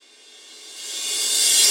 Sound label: Reverse Cym, Cymbals